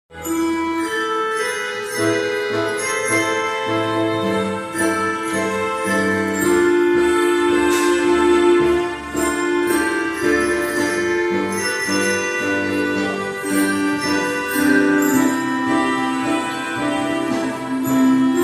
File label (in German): grundschulorchester tango